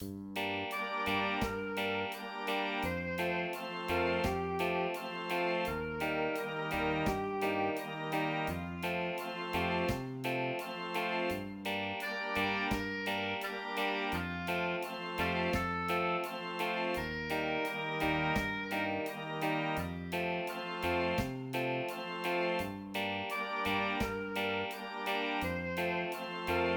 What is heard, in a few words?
one drop reggae